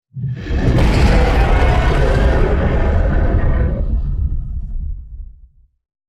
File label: T Rex dinosaur roar attack